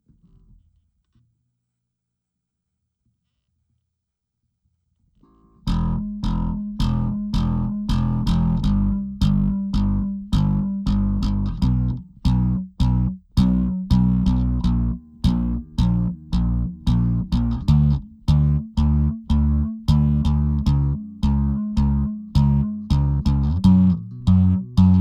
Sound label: Slap